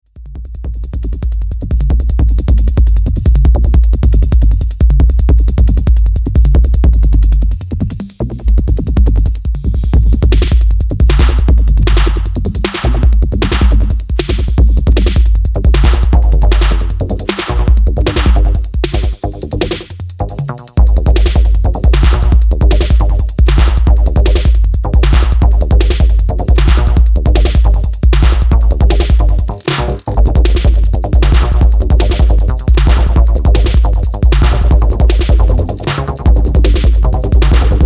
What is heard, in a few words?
Arp Attack